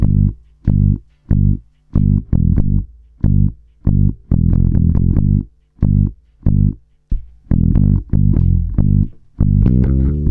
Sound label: COWBOY 93, Bass Grooves; USB Soundscan vol.30; AKAI